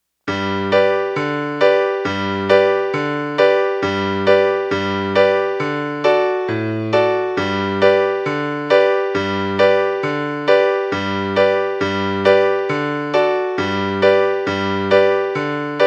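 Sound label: Turkey in G B accompaniment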